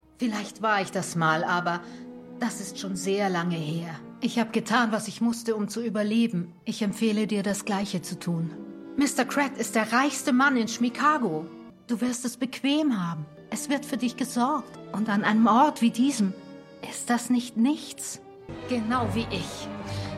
Gesang; Schmigadoon

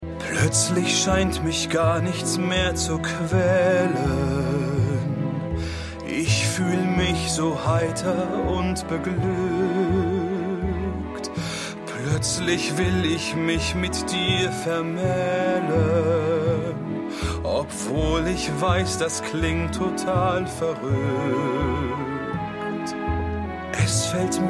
Gesang; Schmigadoon; Doc Lopez

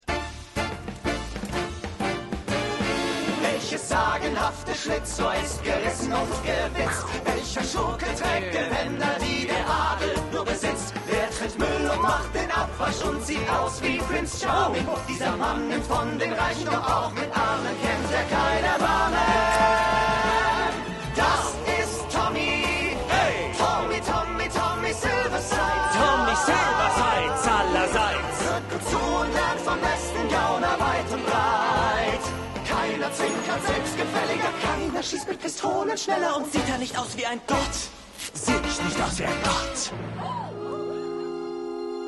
Gesang

